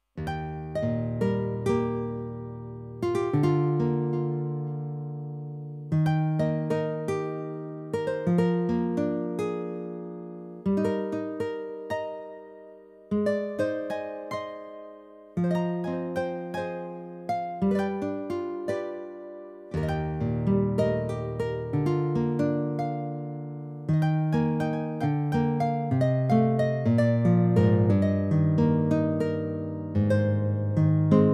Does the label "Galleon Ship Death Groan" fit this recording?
No